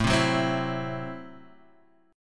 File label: A Minor 6th A Guitar Standard; Am6 strummed; JGuitar